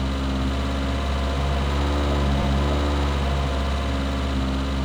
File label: car idle; Matt Hoffman's Pro BMX 2; XBOX